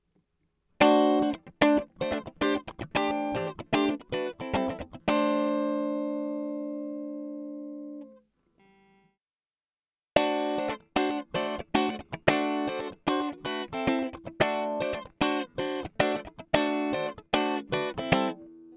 lexicon fw810s guitare dry fx; lexicon ionix

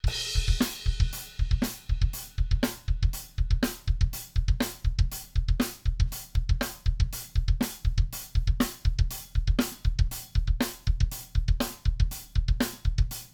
batterie phase kick oh reglage q high; little labs ipb

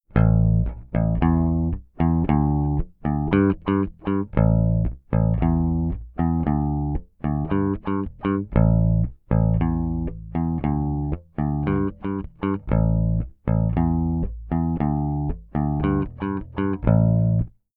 réglage plat médiator, Bass; smpro

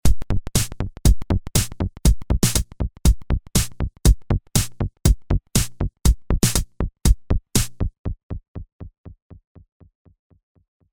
basse ping pong, delay; fxstory delay

